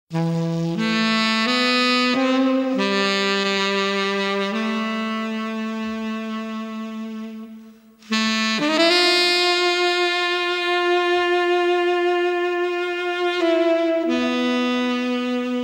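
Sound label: saxophone solo; Jazz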